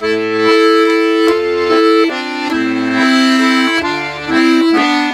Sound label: Accordions; USB Soundscan vol.40; AKAI